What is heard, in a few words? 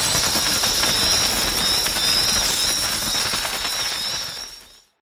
symbols bats; DraculasCastleWD; wazdan